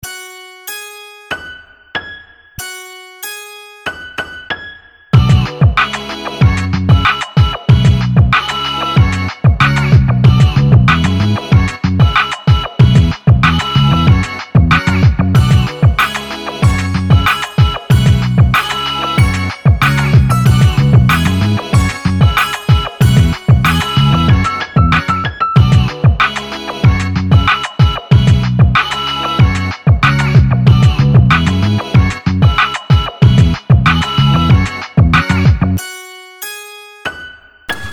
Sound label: beat battle